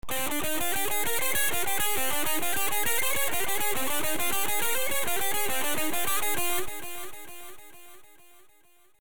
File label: echo